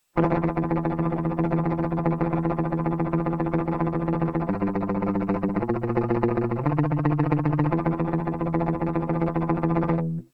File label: thrash pick